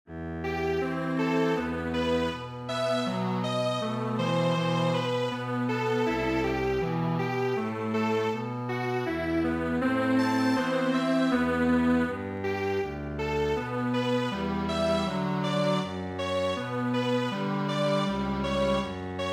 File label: Strings Quartet